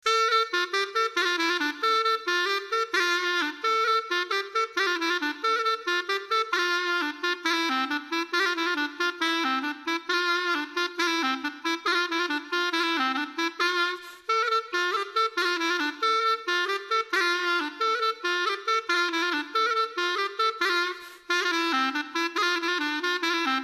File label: chalumeau